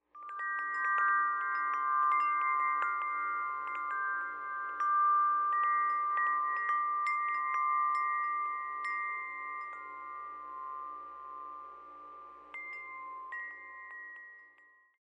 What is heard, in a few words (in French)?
carillon moyen paradis bronze